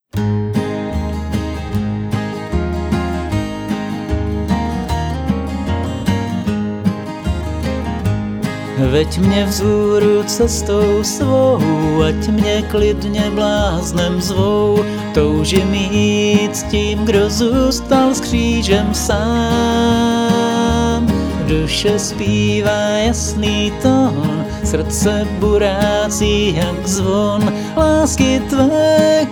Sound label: guitar fragment